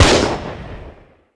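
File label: t1911 fire, weapons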